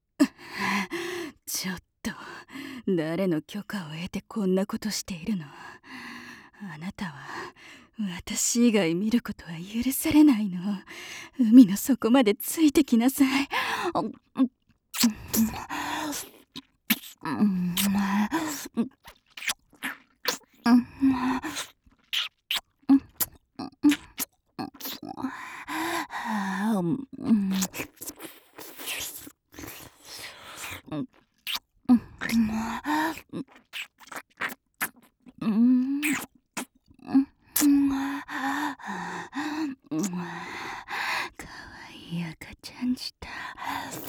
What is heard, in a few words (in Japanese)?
キス; 【マゾハーレム】